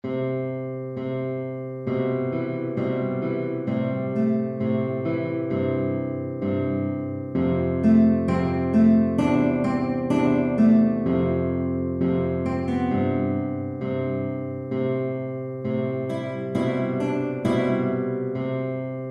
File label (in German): gitarre